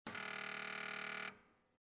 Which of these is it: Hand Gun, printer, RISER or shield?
printer